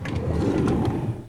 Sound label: drawer open